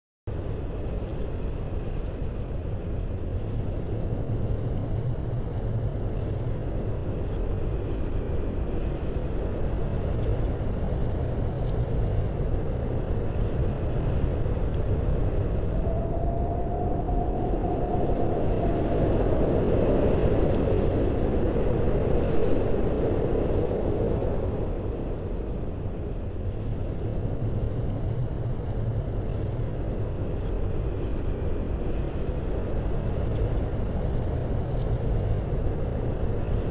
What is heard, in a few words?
Relaxing Sounds; Picross S7